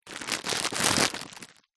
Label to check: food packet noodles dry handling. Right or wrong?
Right